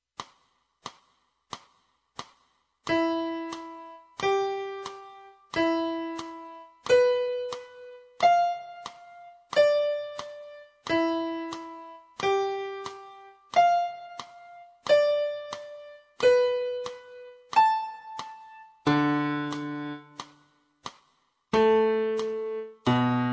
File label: piano